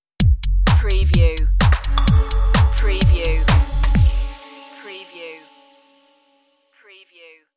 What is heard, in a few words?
fluctuate stinger